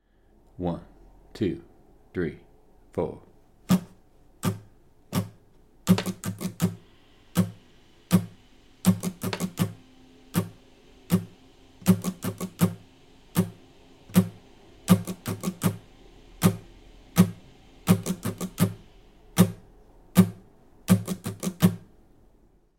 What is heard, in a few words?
strum exercise